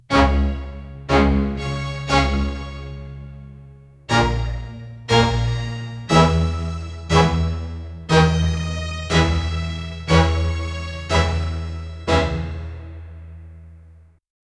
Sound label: ominous rhythm of classic